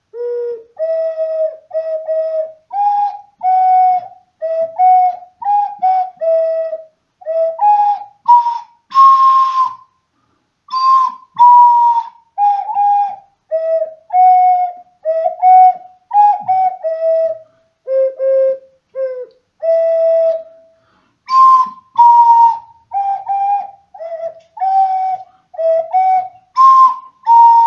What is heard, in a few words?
happy new year song auld lang syne, ocarina